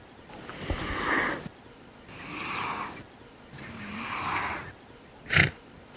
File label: Giraffa camelopardalis alarm